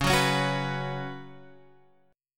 C sharp 7th Suspended 4th, C sharp, down, Guitar, Drop D